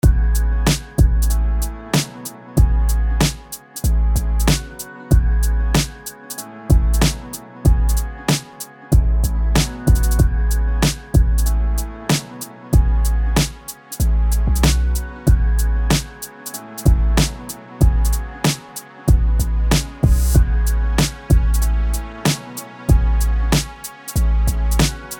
CMinor 94.5bpm

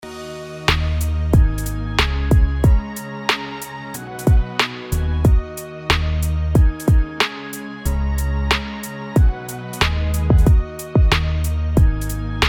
E♭ minor 91 bpm

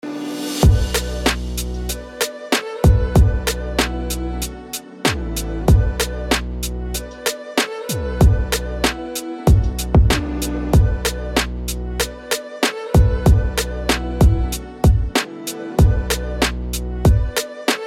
B minor 95bpm

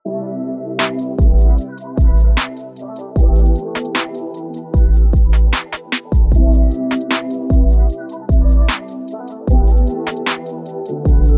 E minor 152 bpm

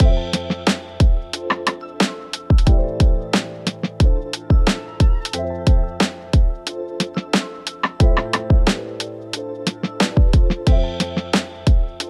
A minor 90 bpm

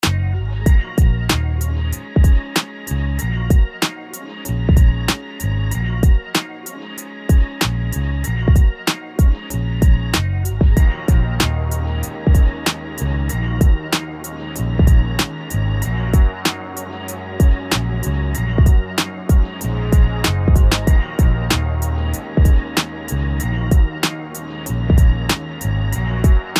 B♭ minor 95bpm

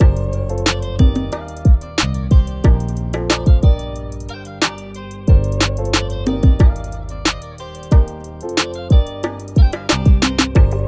G minor 91bpm

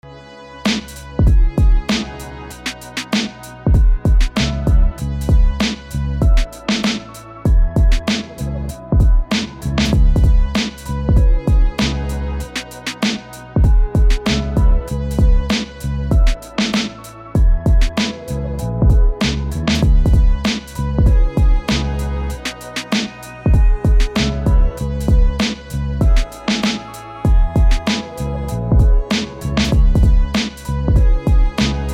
C minor 96 bpm

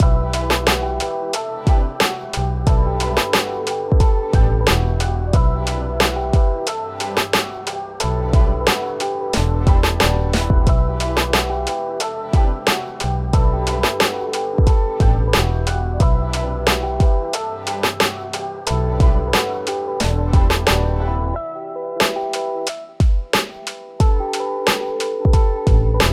E minor 90bpm

